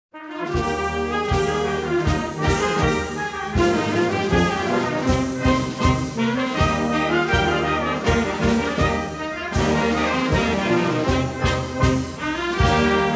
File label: drums; dumbartons